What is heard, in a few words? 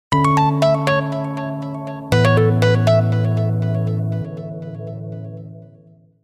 Light hearted, notifications; Lenovo; S660